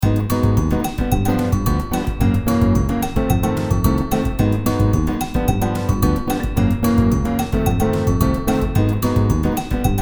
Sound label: samba